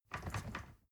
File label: ladder wood rattling